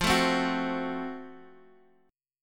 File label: Gb Minor Major 7th Flat 5th, chord